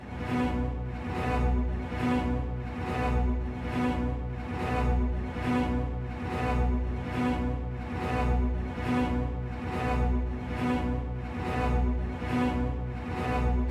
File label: bass alt; Sky Diving